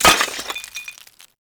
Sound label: Destroy Planter Hanging; Warframe; Ambience; NineteenNinetyNine